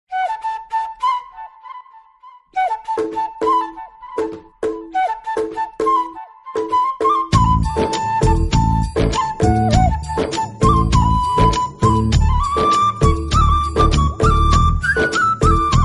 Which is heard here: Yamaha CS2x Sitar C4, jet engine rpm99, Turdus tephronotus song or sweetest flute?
sweetest flute